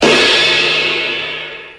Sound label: crash cymbal sample a key; cymbal drum samples